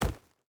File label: footstep burlap forest jump land; Rust